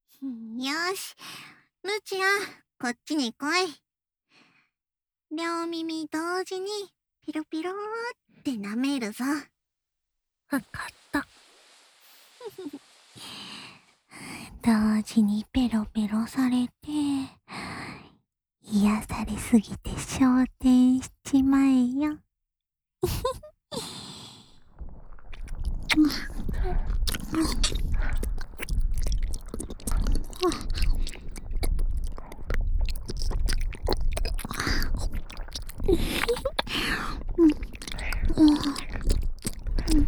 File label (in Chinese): 双耳同时舔耳（左右）; 由卡忒莉娜和露琪娅带来的